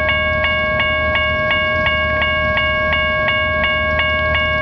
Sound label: Electronic Bell